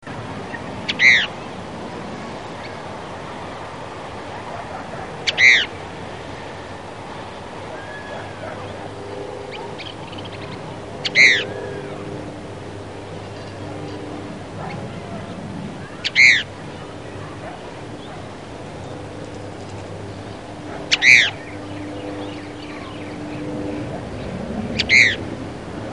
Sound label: Cassins Kingbird